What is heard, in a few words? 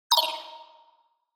Cyber